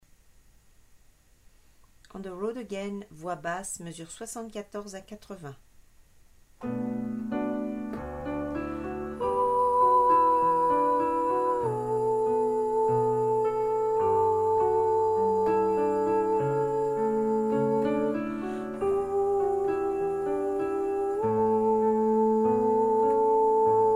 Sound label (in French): basse